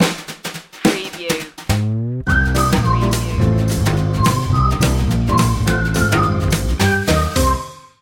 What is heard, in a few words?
magic whistle